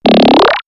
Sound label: Warped Again, synthfx; X-Static Goldmine